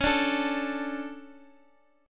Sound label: D 5th C sharp; D5/C# strummed; JGuitar